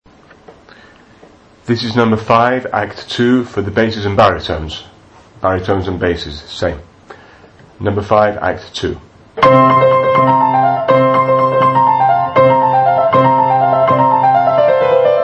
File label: baritone bass; gondoliers